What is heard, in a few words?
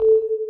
ping